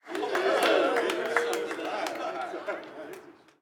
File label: street ball crowd cheer low; SFX Kit; Crowds